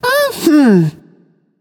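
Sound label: nonverbal agree; smite; ratatoskr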